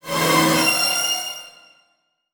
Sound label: Transition Musical Tone Drone; Production Element; Title Transition; AdobeCC